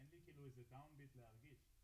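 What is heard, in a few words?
BD Out, bass drum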